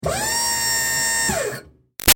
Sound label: Servo Moving Truck Short; Motors; Machines